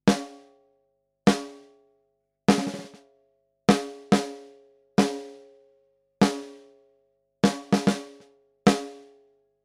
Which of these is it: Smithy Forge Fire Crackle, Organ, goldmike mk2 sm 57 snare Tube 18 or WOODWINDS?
goldmike mk2 sm 57 snare Tube 18